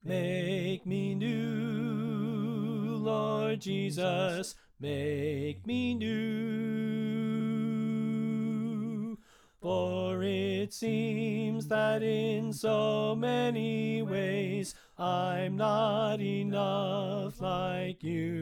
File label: Soprano Left